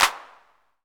CLAP, TR-808; 90 s Sample CDs; Sound & Vision - Gigapack I CD 1 (Roland); TR-808 & 909

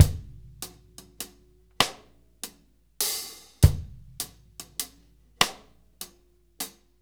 6/8 Loop, Drum Tools, Laid Back; Sonic Foundry (Sony Creative Software); Loops & Samples Drum Tools

